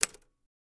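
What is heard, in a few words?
Typewriter Single Key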